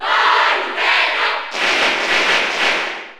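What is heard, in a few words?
Cheer Spanish, crowd; Palutena; SSB4; SSBU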